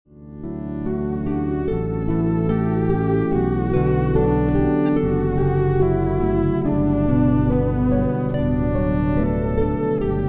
Canon in D Duet, Electro-Acoustic Harp Electric Violin; Pachelbel